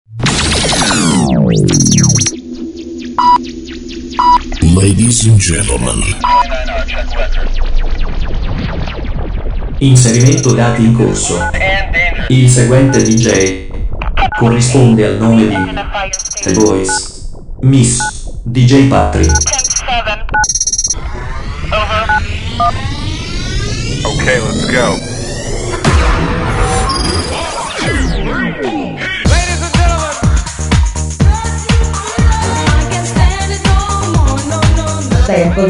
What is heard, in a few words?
jingle